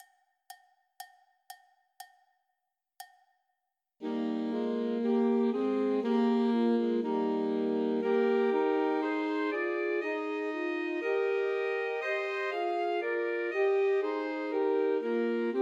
Unto Us a Boy is Born, Christmas Trios for Three Alto Saxophones